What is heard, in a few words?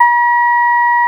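Troubadour Harp F3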